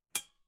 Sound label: impact metal hit